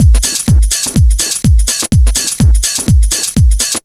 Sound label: loop 125bpm, House Drum Loops; E Lab; XTc Files of House; 122-134bpm